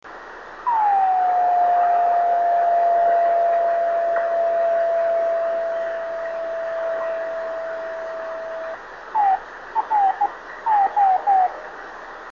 26780KHz

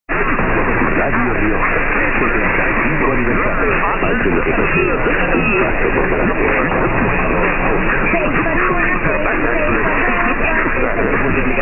dx 1179 spain r rioja

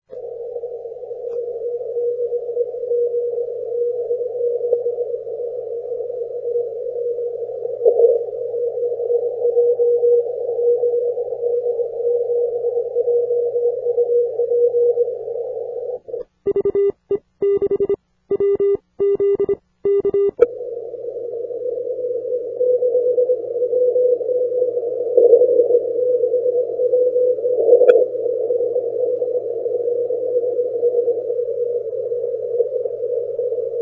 80m cw; 80m DXCC Entities worked from 2002-2008

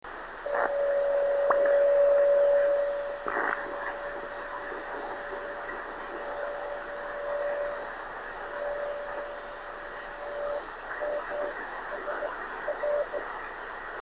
driftnet beacons; 26.900

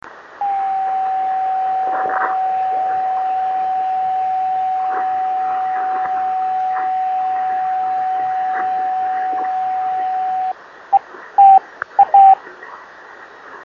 26950KHz

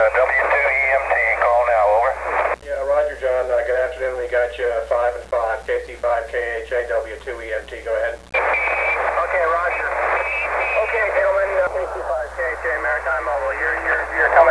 radio

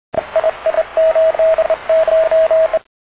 cw

